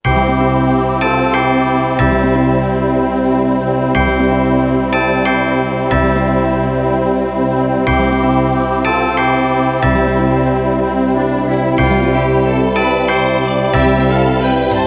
schulmusik stille nacht playback

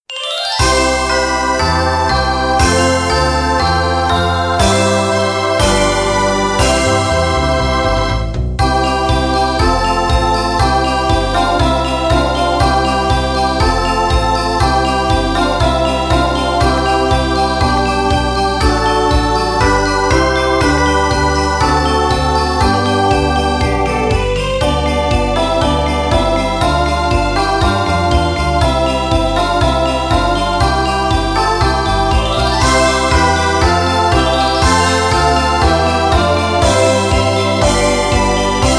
kinderlieder o du froehliche playback

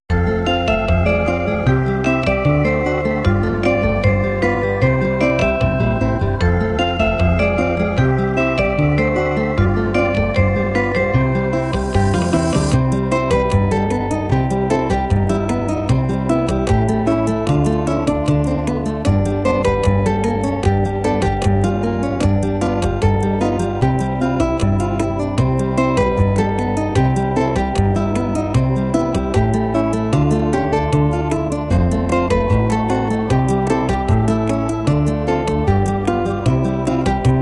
zirkuslieder zirkussterne sind die groessten playback

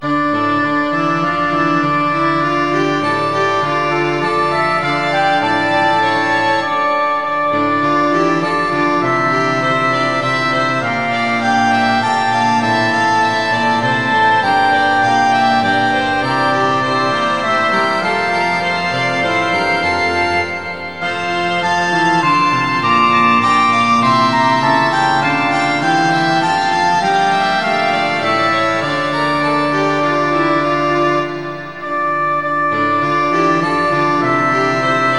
es kommt ein schiff geladen partitur; weihnachtskantate

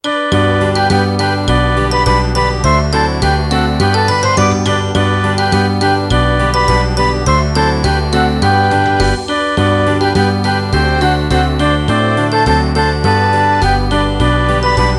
kinderlieder playback

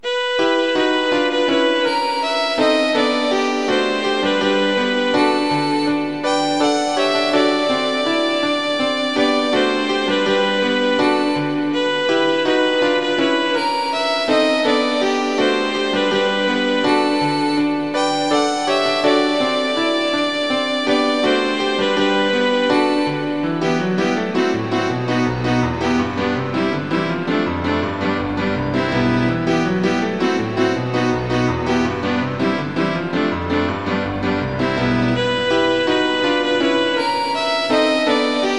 violine u klavier; kinderszenen; fuerchtenmachen

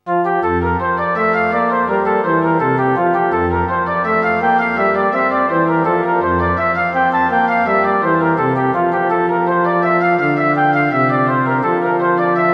nun danket all und bringet ehr, orgel

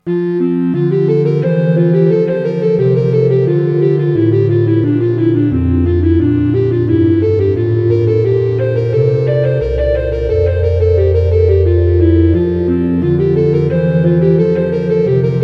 lobe den herren, orgel

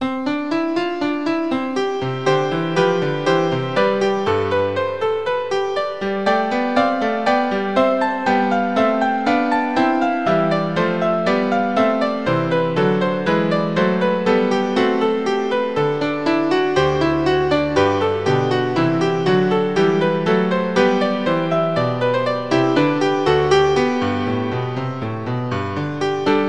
invention mit 3 stimme; invention nr 1 j s bach

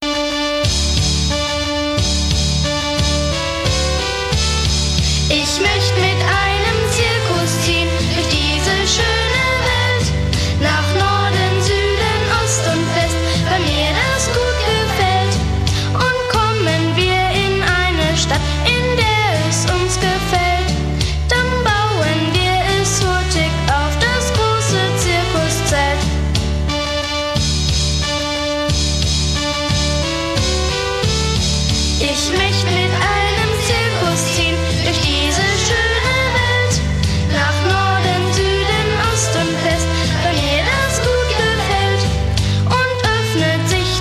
zirkuslieder

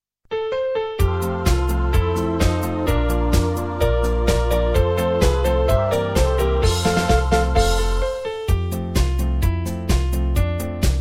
zirkuslieder playback

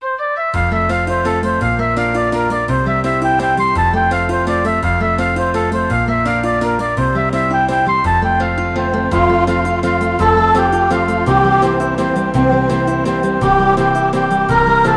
zirkuslieder willkommen in unserm zirkus playback

